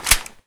Inf Weapons Foley; Red Orchestra Ostfront 41-45

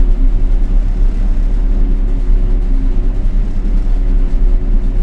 blenheim cockpit r, engines; War Thunder

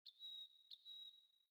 sting songbirds; Rust

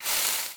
fire; Rust

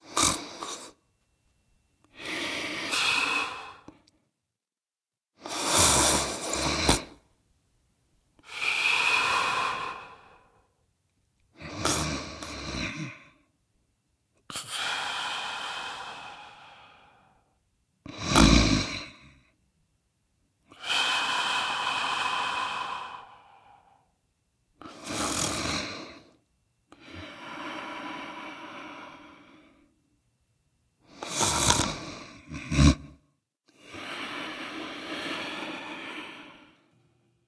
snore; localized english; voiceovers; UK; Scoutsniper

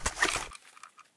weap mag pullout, weap; Escape from Tarkov

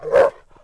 Wolf Fort Vox; Civ4 beyond sword

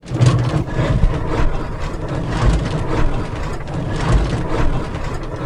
FW-190D9 canopy close, aircraft; DCS

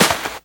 foot dirt sand, footsteps

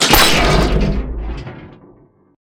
objects, World of Tanks

